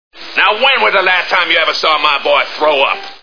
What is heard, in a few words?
throw up; Cool Hand Luke